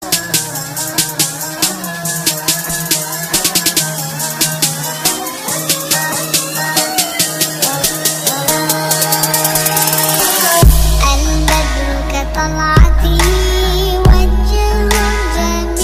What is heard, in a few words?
arabic trap ringtone